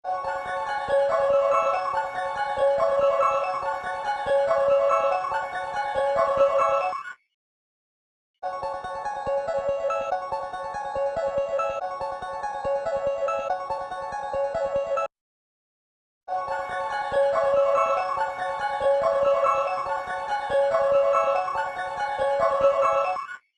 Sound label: H910 dual harmonizer